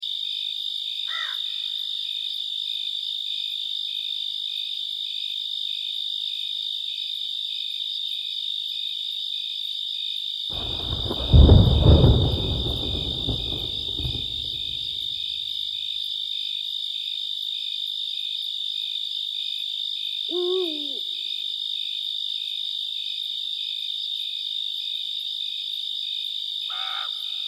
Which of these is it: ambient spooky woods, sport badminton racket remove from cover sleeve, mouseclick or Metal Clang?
ambient spooky woods